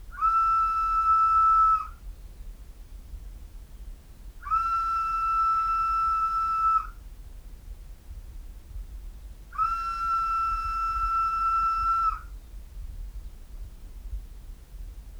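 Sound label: 3 whistle in courtyard rec half up stairs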